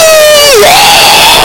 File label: man screams while taking a shart